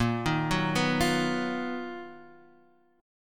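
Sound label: B Major Sharp 11th, B flat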